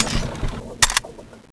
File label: clip out, weapons; cso; turbulent5